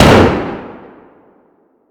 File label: shotgun fire atmo int; gmod; viper